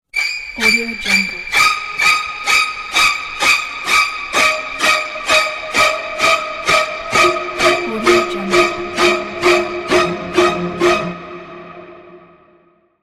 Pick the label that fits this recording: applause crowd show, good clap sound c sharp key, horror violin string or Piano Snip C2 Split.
horror violin string